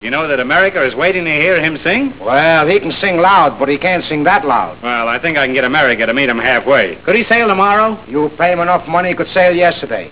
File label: sing; opera